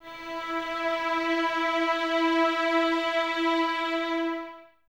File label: Violins E 4, String Ensembles; Full; 23 Violins; Miroslav Vitous